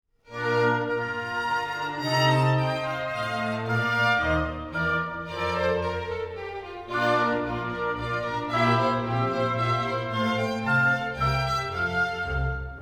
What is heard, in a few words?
Menuets - in B flat; Mozart; Serenades-Divertimenti-Dances